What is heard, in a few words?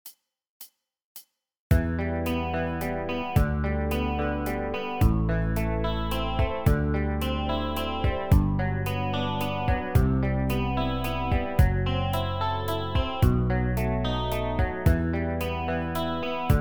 Spanish Guitar backingtrack